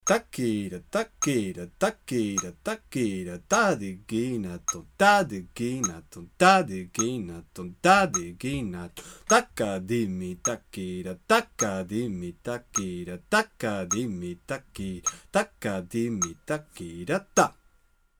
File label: same gati changing jathis